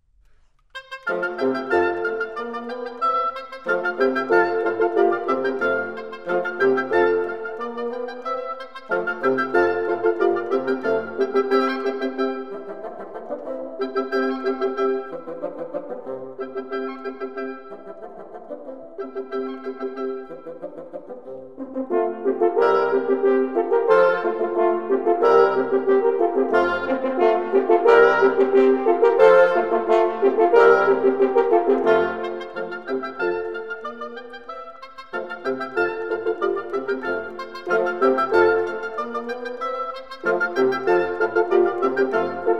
Divertimento in F Contredanse en Rondeau Molto allegro, Wind; Mozart; Serenades and Divertimenti for Wind